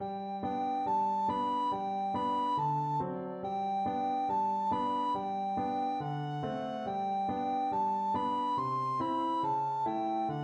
yankee doodle recorder

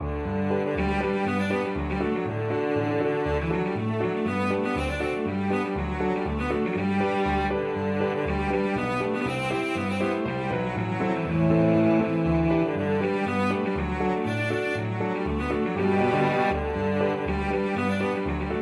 storpolska, cello

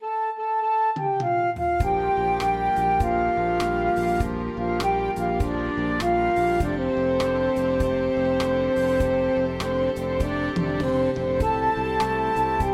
BAND, jazz ensemble

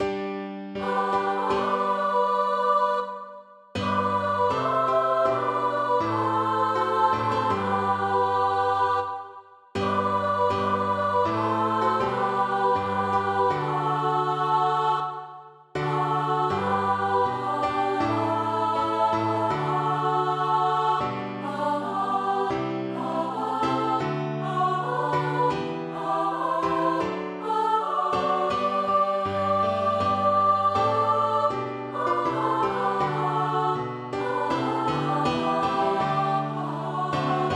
choir; dowland; come again

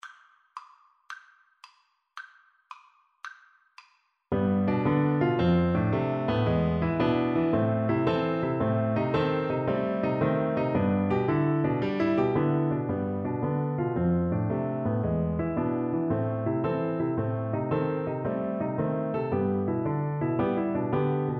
Oboe

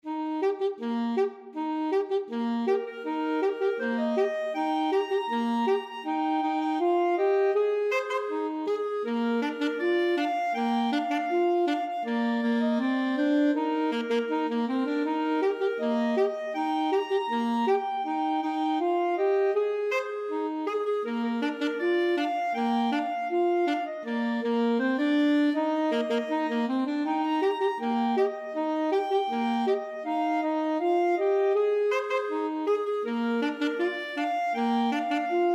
wabash, clarinet saxophone duet

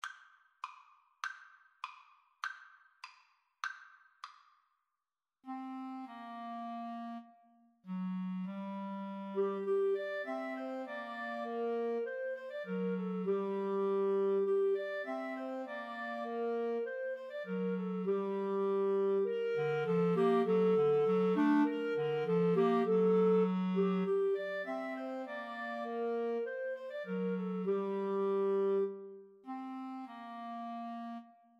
Clarinet Trio

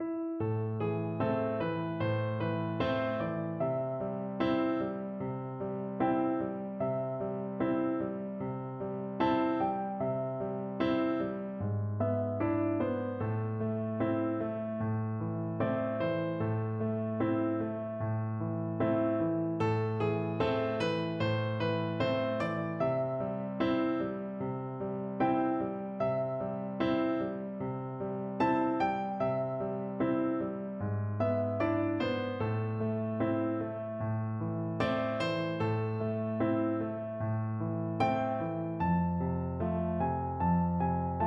piano

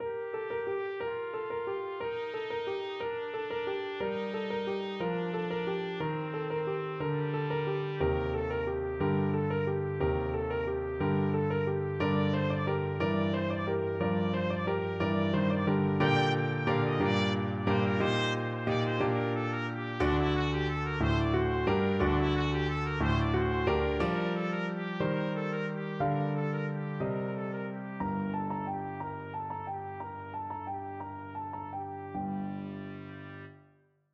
trumpet

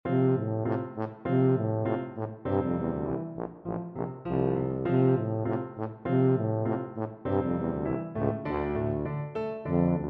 Turkish March, tuba

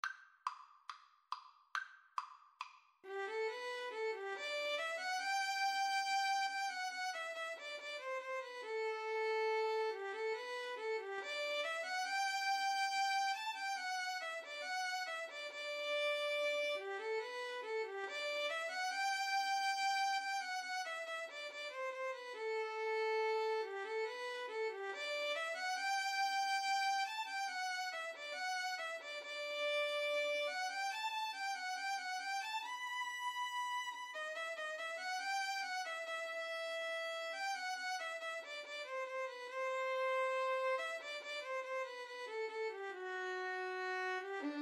Violin-Viola Duet; Handel, George Frideric - Gavotte in G HWV 491

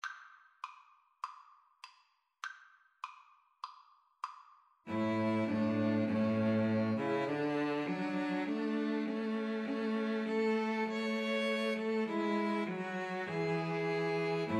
2 Violins Cello

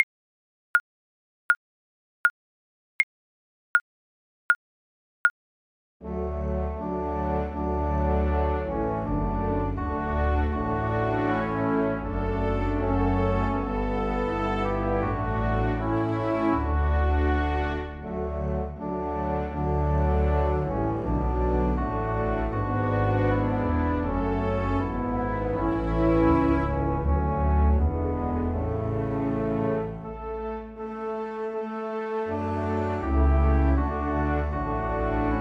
viola

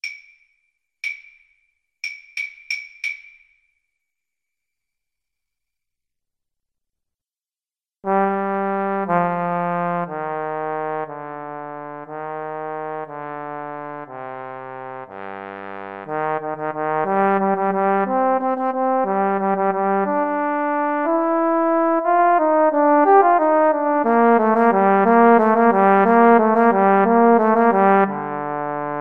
carol of the bells trumpet trombone Duet

